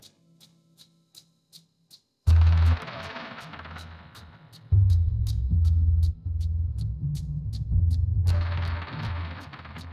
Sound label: suspense music; sound effects